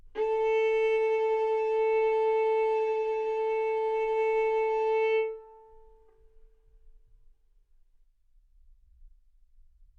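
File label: Violin arco mf A string A4 B4 stereo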